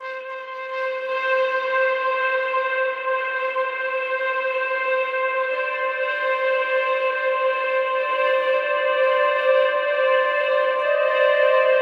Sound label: Moonlight Mystery Flute Pad